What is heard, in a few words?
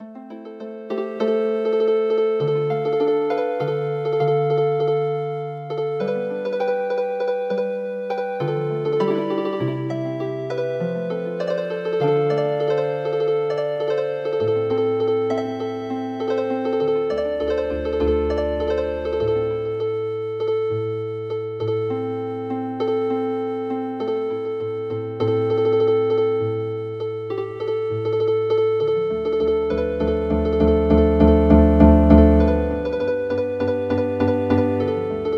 Sword Dance Song Healing Harp